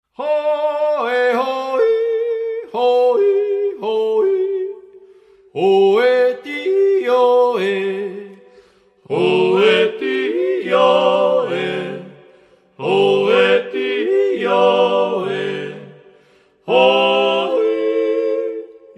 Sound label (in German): jodler